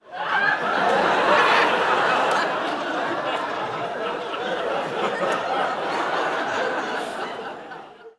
laugh

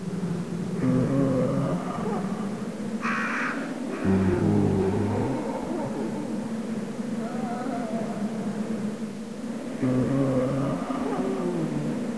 city ambient

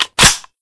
lr300 mag in

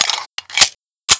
pistol reload

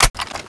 tavor clip in

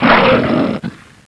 attack

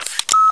c4 draw pin, weapons

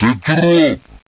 attack, troll

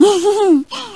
aim; kc shimakaze 22

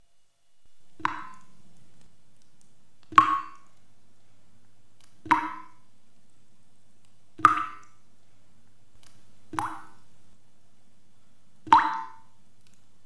shower room drips

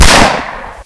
fire; udcop; henry

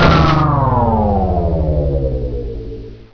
rotor off

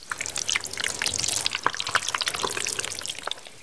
bleeding

